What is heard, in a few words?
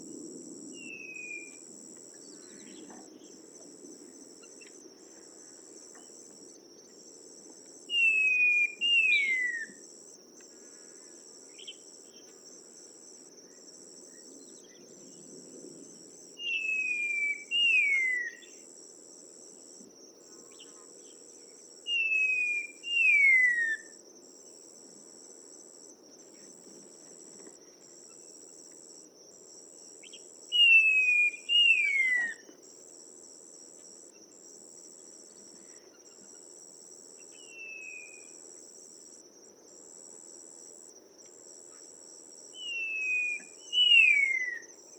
Babax lanceolatus; پرنده باباکس چینی